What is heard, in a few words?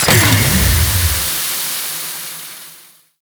Emplacement Overheat; Grineer